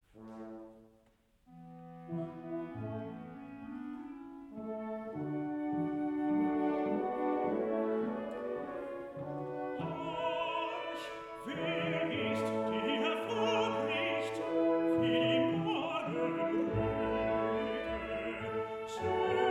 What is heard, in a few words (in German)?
Duett; Salomo und Sulamith